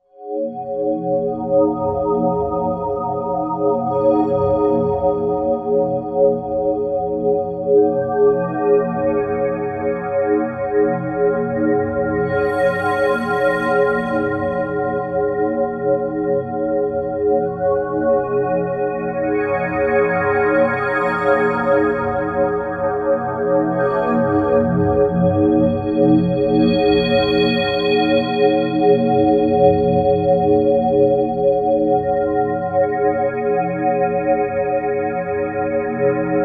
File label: Shattered, Roland JV-2080